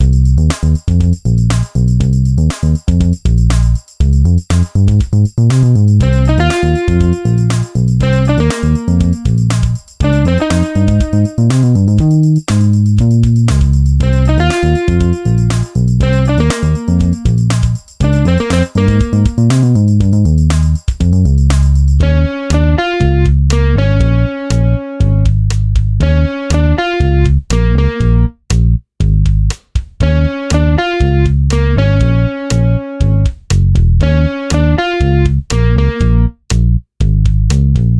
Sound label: bassline